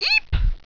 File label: eep, pain, sultry